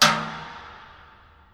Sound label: bucket on; weapons